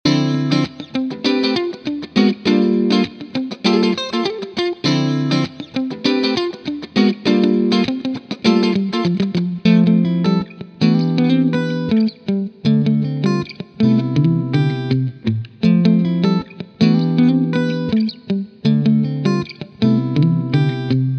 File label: Clean Guitar Stacks